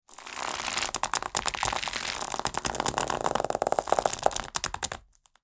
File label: Rope tighten and stretch long